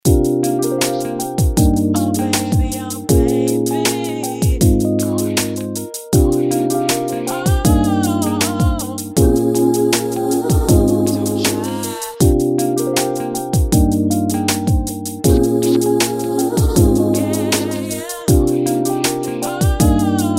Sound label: RNB